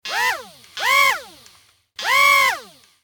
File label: Small RC toy servo motor whirring